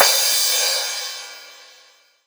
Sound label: Crash, Dubstep One-Shot Drums